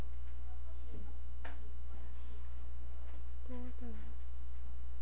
bottle

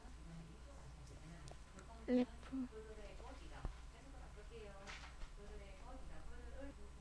laugh

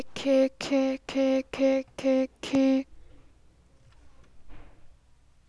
kick

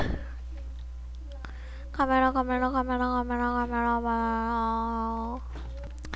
camera

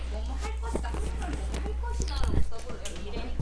cry

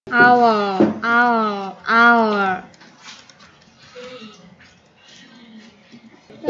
owl

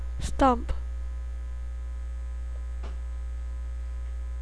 stomp

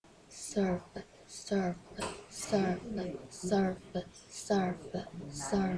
surf

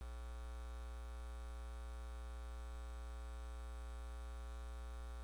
howl

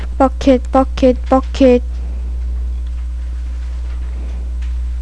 bucket

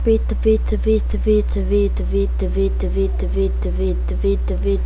beat

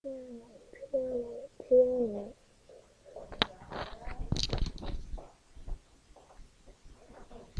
piano

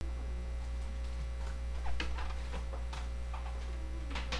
growl

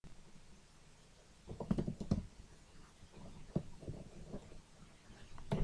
noise

